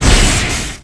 Chain Electric Shock

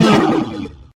Hit

